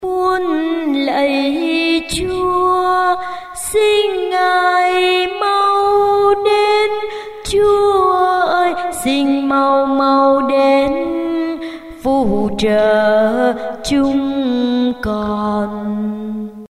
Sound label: alto basso